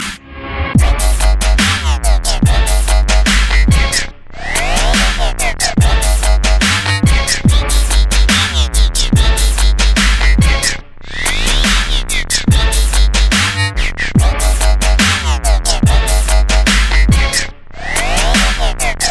very heavy dubstep

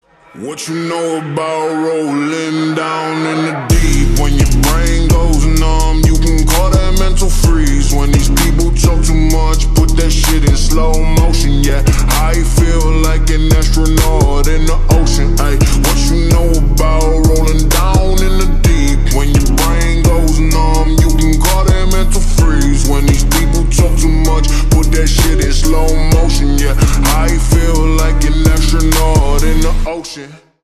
slowed reverb

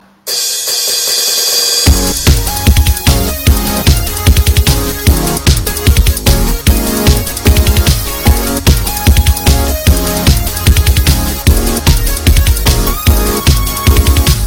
japanese techno

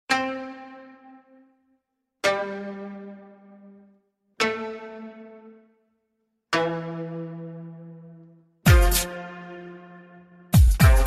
canon in d remix; samurai champloo

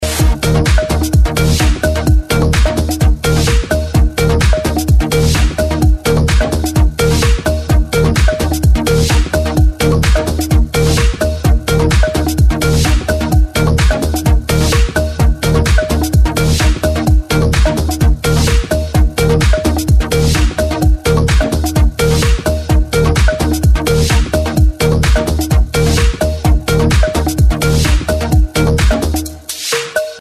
funk xylo

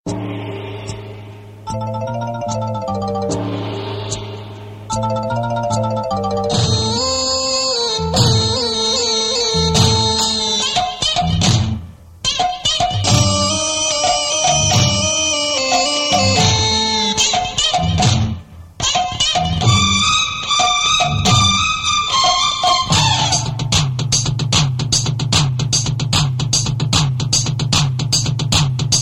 romance telugu

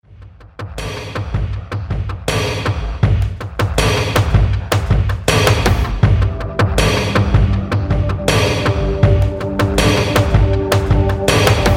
mechanic drums

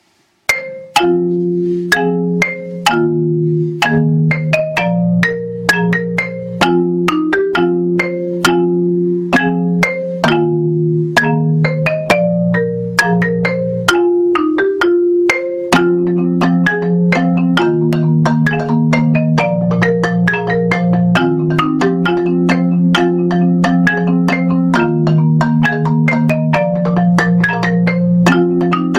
marimba; Legend of Zelda: Ocarina of Time

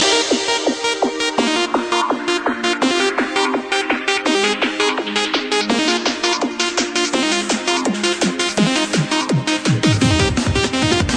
techno remix

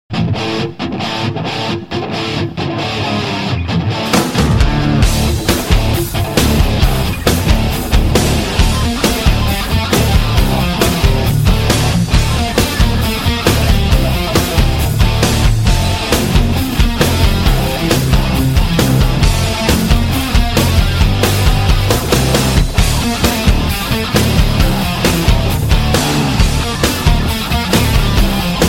indian rock

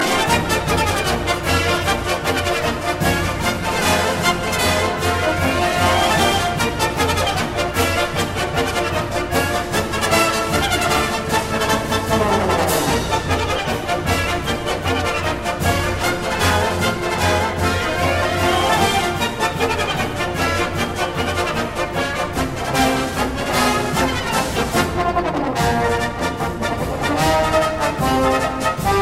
circus fanfare

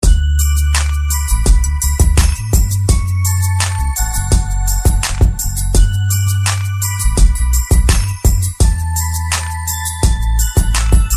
romantichnaya sms, romantic sms

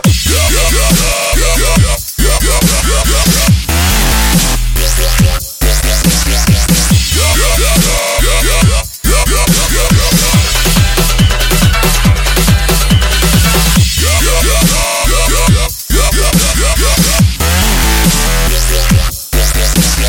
samba dubstep

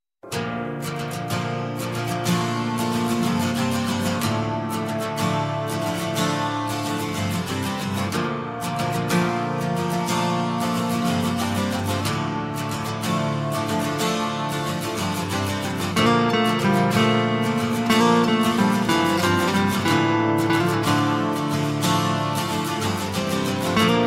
acoustic guitar

